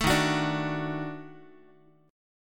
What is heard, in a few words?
D sharp Minor Major 7th Flat 5th D sharp Guitar; strummed